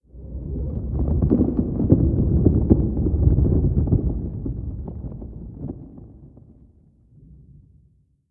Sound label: earthquake rumble; sub earthquake rumble; Modern Warfare